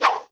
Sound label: Whoosh; el chavo una feria en la vecindad; ios mobile gamerip 2012